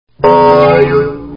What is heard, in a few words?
Boing, cartoons